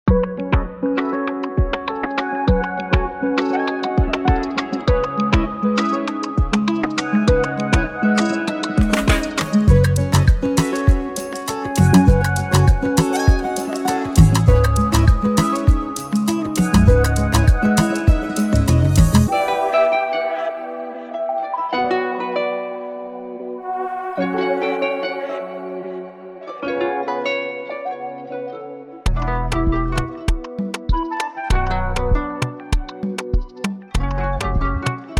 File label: ultimate afrobeats essentials; eden vol. ii; red jon